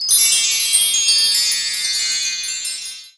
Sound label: Sparkle; Zelda